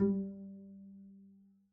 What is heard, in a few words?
pizz g3, double bass